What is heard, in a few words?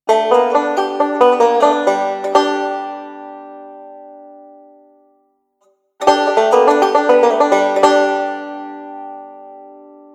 Banjo